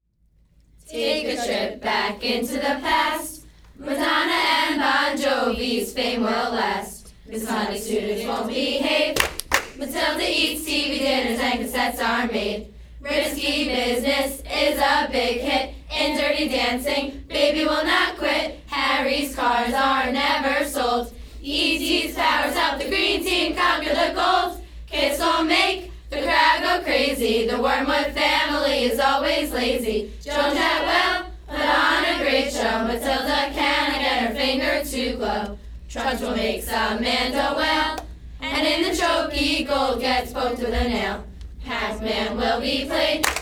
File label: cheers